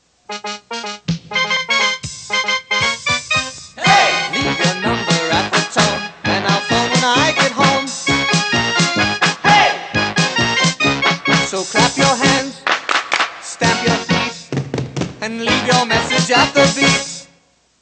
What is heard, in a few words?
Polka Phone, Novelty Answering Machine Greetings; Funny Phones